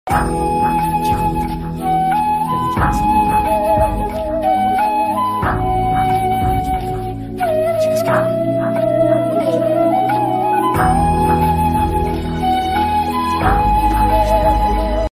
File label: flute ringtone romantic; whatsapp status; beautiful nature status; krrish